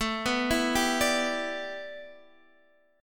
A 7th Suspended 2nd Suspended 4th A Guitar DADGAD, chord